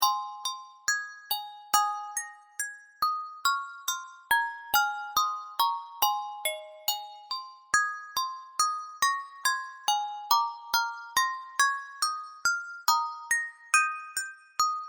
Music Box

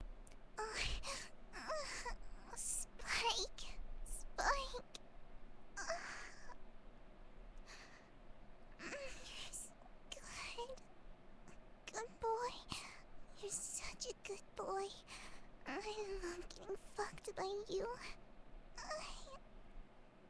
Moaning; Vocaroo; Fluttershy

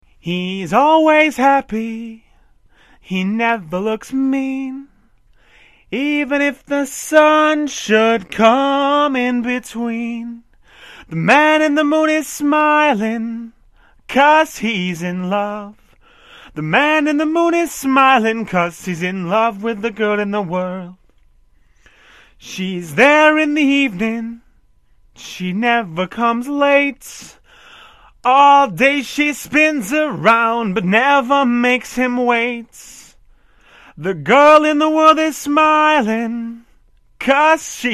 singing

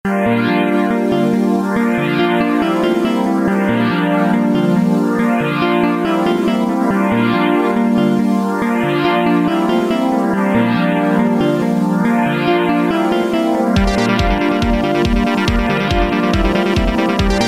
Disco

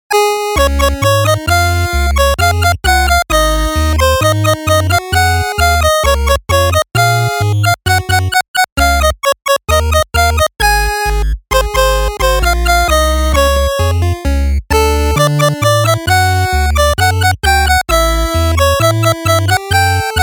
8-Bit

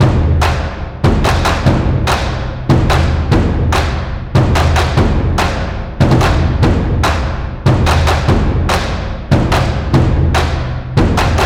taiko drums, 145BPM

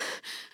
Breath; Lewd SFX

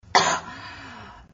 Cough; Lewd SFX

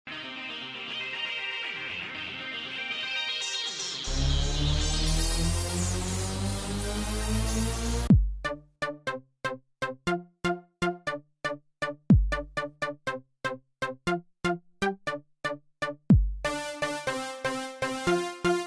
background music

